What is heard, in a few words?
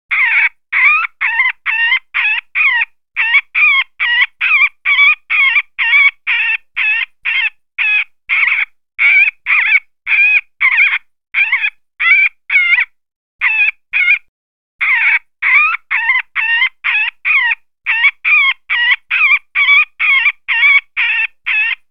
Meadowlark